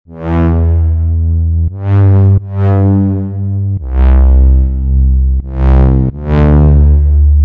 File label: Bass; Warrior; FOCUS Preset Pack